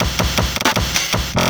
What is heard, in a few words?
LOOP SD, Drum & Bass, 160 BPM; Ueberschall; Drum & Bass Flash Trackz